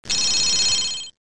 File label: phone